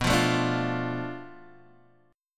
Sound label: B Minor 7th Flat 5th B Guitar Half Step Down; Bm7b5